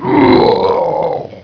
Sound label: death; quake2; orc